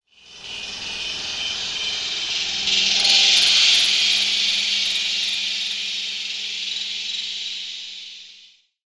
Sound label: Ambient FX